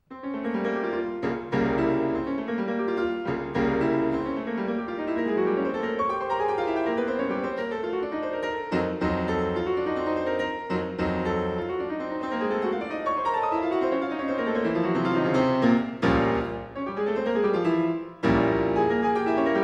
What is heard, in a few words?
preludes op 28 no 18 in f minor; מוזיקה קלאסית